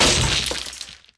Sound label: destroy